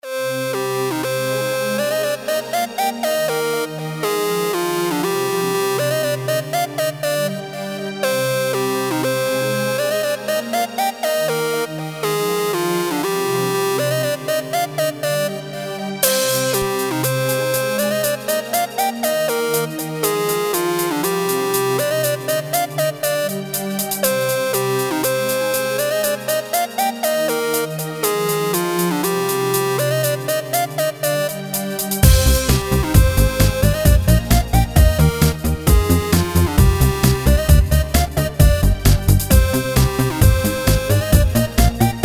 The Rowdy Tavern

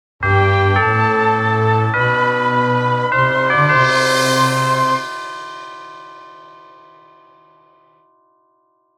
victory

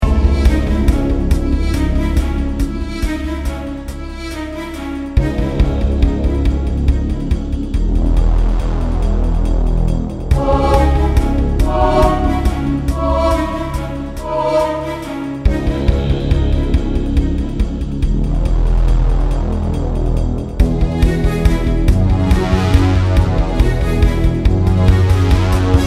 carol of bells; bobjt

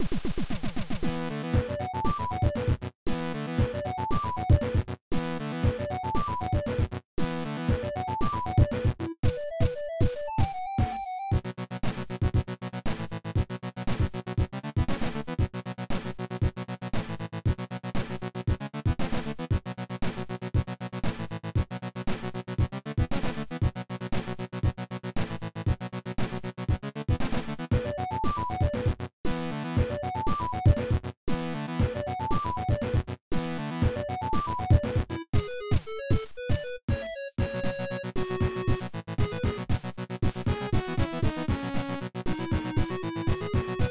battle theme

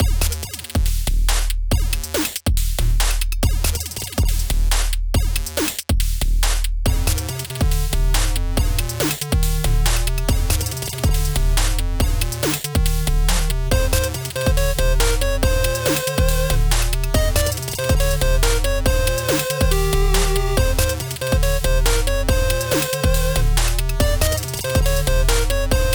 level 8-bit

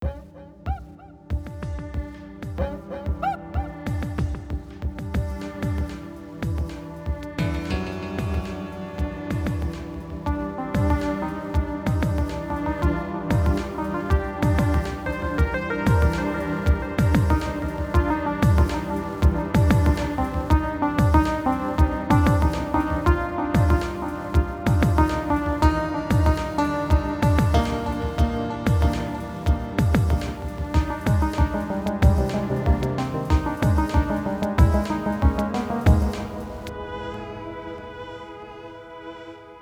game over

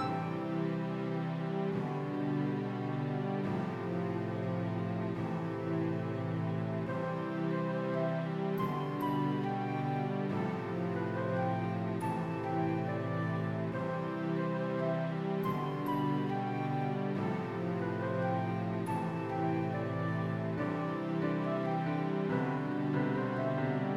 more lively with harp

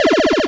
laser

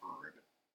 ribbit, frog sounds

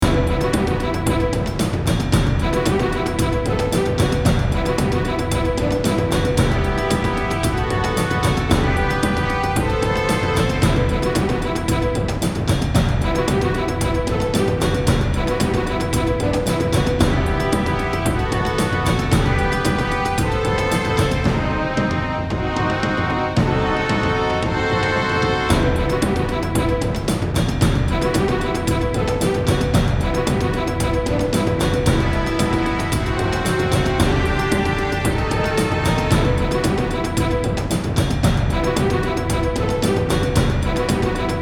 Battle Music; RPG - To Arms!

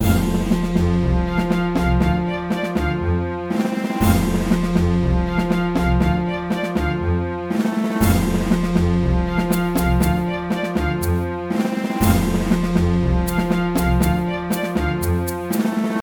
pirate ship in game

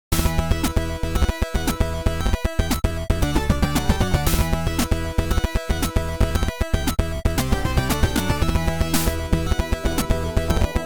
boss battle; carnageboss

